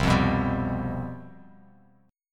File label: C Major 7th Suspended 4th, down, Bass Standard; strummed